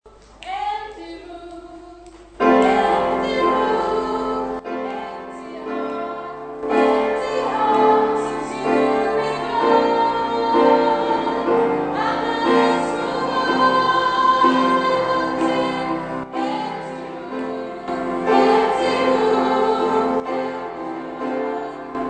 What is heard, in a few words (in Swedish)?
sopran